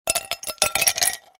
ice in glass ringtone

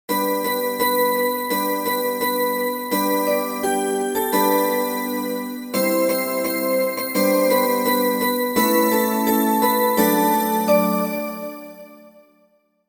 jingle bell instrumental

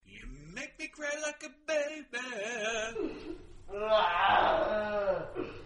Baby Cry

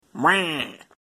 wah ringtone; waluigi

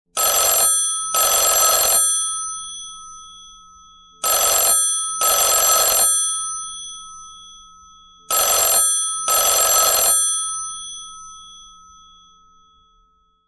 tring tring ringtone

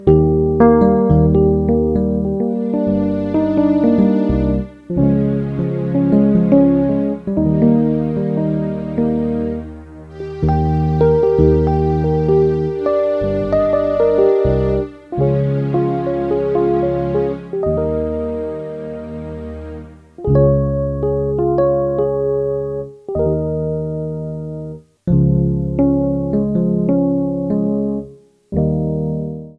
ringtone

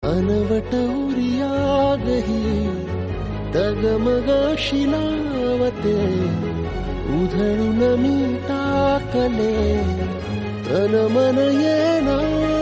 Marathi

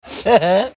laugh; holly willoughby

